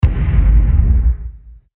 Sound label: impact